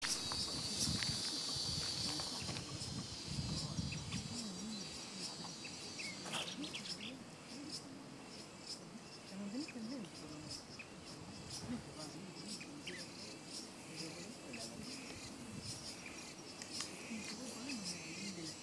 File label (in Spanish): fiofio pico corto